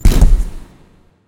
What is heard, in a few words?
flash